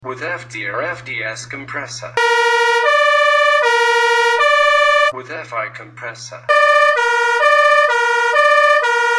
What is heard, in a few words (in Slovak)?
fanfara spoiler; fiamm ta